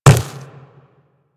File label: Quadruped Medium Walk Dirt, footsteps